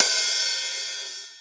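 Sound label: gated overhead, Drums Acoustic; USB Soundscan vol.10; AKAI; 90s sample CD; GATEKIT 3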